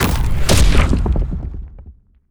tiger drop

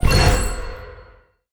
ui next area

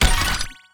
furni Retro Car begin

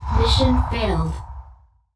lose

